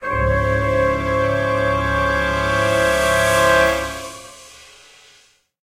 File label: Cabin Stinger